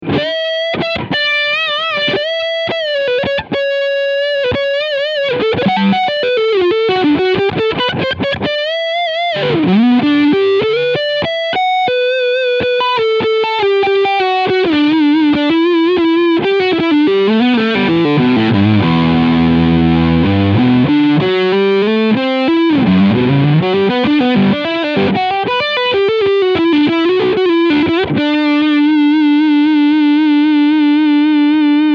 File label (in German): Leadsound Steg HB